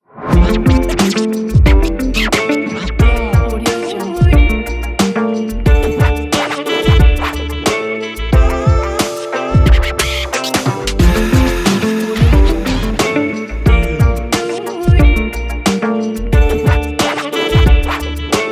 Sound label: podcast intro ident; stereonuts